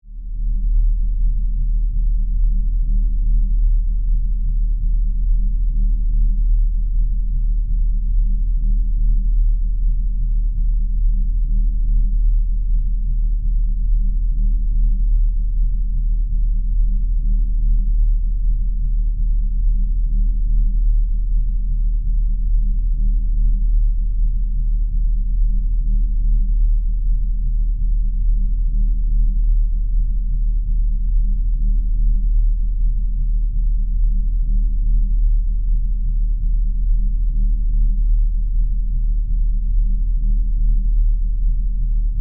Spaceship Interior, Rumble, Drone, Deep, Throbbing, Bass; Science, Fiction; Spacecraft; BlastWave